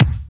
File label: KICK, Tekno Industrial; East West